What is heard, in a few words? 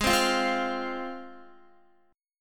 Ab 6th Ab, Guitar; x,11,10,10,12,12; Ab6 strummed